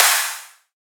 Dubstep Clap; Claps; Ghosthack; Dubstep Sound Arsenal

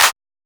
Clap, Techno; Techno Sounds 2024

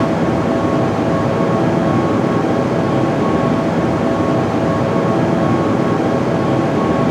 Texture Loop, Techno

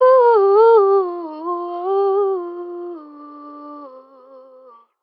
Ad Lib Dry - C Min, vocals; Cymatics - Eternity Sample Pack; Ad Libs

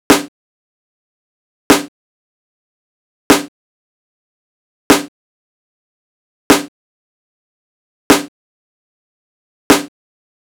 Nostalgia Drum Loop - 150 BPM Snare; Cymatics - ANALOG EVOLUTION Beta

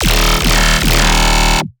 Bass Shot D#, dubstep; One-Shots; Cyber Troll; Ghosthack Advent Calendar 2021 - Day 16 - Dubstep Basses

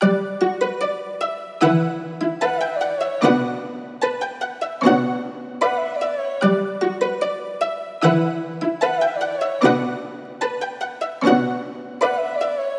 Nostalgic Melody Loop 150 BPM B Min; Cymatics; 2020 Melody Collection

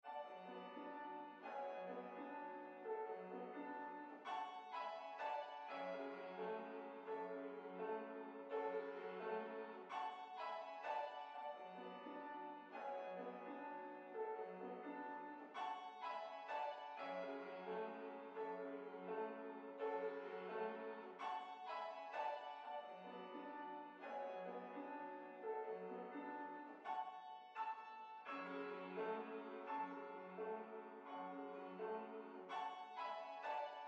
85 BPM F Min Piano Reverb; Loop Stems; Cymatics; Rushing

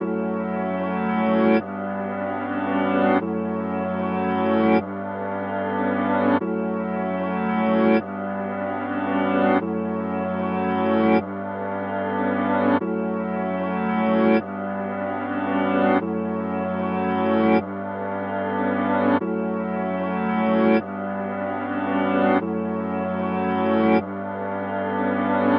150 BPM B Min Pad; Melody; Reverse; Loop Stems; Cymatics; 2022 Melody Collection - Reverse; Trend

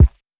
Kick, D; Drum One Shots; Kicks; Cymatics; Eternity Sample Pack

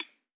Percussion, Hip Hop, Drum One Shots

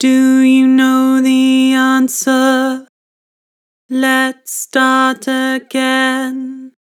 Vibrato, Vocals; Do you know the answer; Harmor Vocals

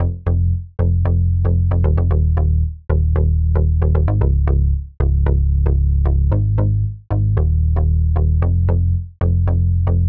114 BPM F Min Donk Bass; Retro Collection

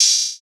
Open Hihat; Drum One Shots; Cymbals

